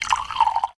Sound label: Drink; the 39 steps